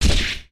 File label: kick middle